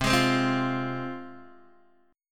C Suspended 4th Sharp 5th, C, down, Guitar, DADGAD